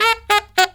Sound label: SAX; USB Soundscan vol.29; Killer Brass Riffs; AKAI